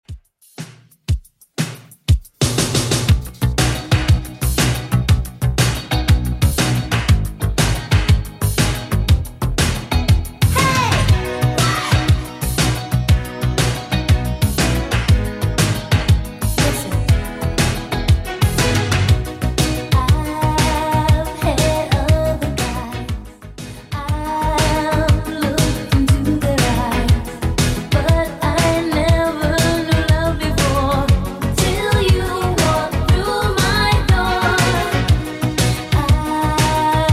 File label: 80s Redrum 120bpm; remixplanet